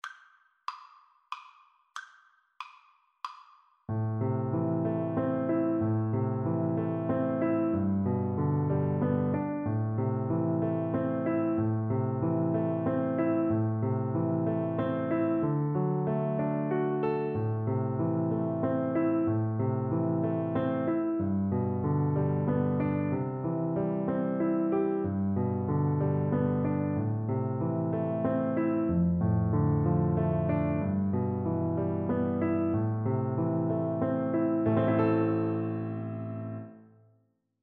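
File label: scarborough fair, glockenspiel; Percussion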